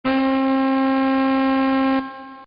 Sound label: TENOR SAX; Buttons; Multimedia; Partners In Rhyme